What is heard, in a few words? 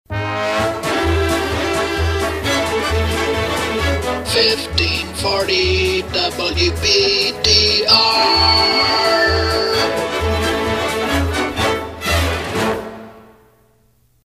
Uptown Hoedown Sonovox; WPTR; PAMS